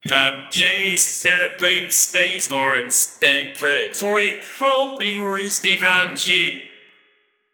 their mission complete, background music

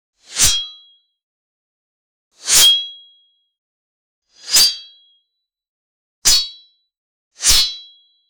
a sword slash sound, medieval

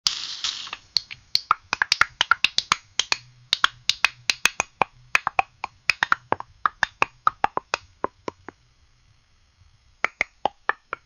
popping of popcorn begins, cooking

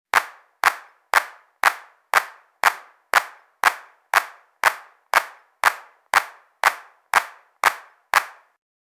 clapping sound effect, performance

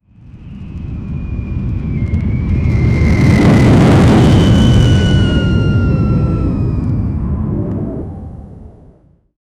the eagle emerges from th

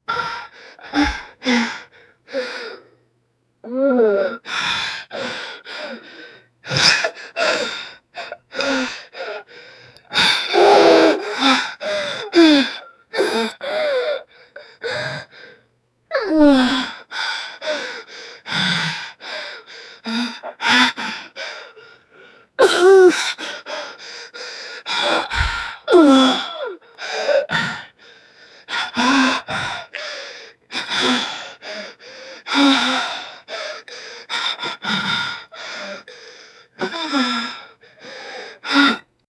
years old man holding, mouth closed

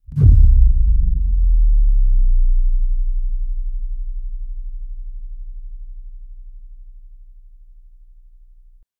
bass with big impact, boom

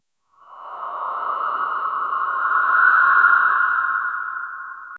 creaking wood soft eerie; horror

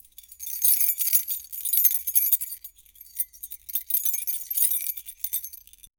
sound of keys jangling; everyday

